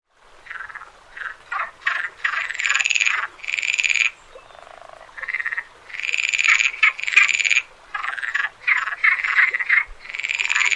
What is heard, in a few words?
iberian water frog